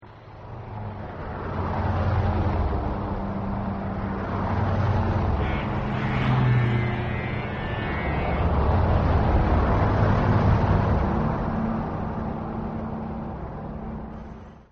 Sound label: highway